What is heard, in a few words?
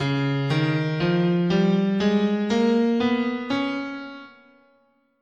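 D flat Lydian Dominant, guitar, scale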